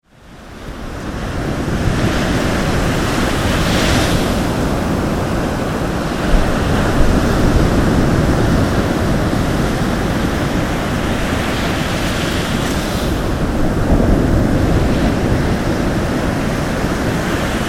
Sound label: Waves, holophonic